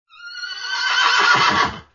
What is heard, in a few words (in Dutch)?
paard